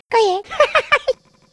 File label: hunter pain; huggy wuggy snpc; elmo